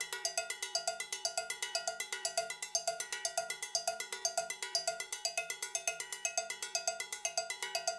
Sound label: Timbale, acoustic percussion loop; Drums & Percussion; Percussion Loops